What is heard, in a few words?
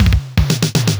Fills 120BPM